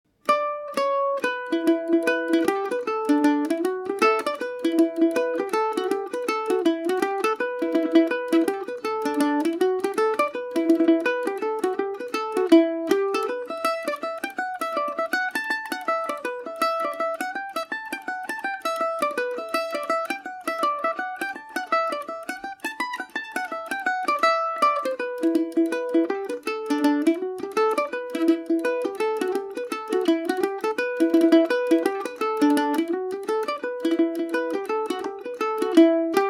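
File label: E dorian, Irish mandolin; humours of castlefin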